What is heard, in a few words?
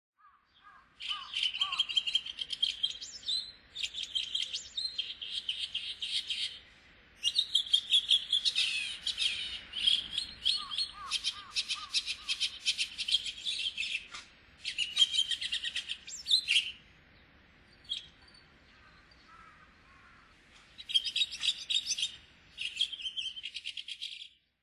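komukudori, Chestnut-cheeked Starling; koe; muroran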